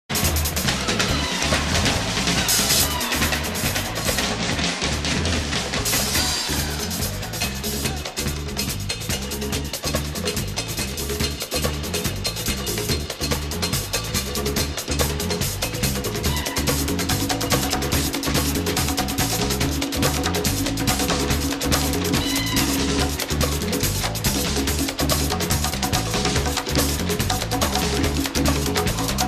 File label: Laughing Drums